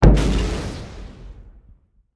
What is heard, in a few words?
damage vehicle; World Of Warships